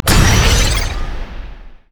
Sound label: dash; LoL; vi